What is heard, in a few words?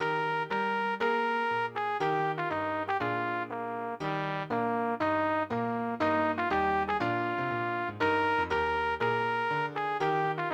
Trumpet Voluntary; purcell